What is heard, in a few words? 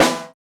snare samples, Rock